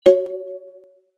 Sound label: close error